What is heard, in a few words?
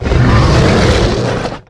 spawn, tyrant; svencoop